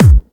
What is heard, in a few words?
Trance Kicks; Vengeance - Essential Clubsounds Vol.5; Bassdrums